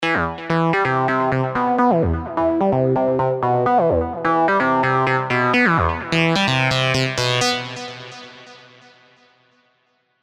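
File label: Dark Comedown, 303 sequences; Spire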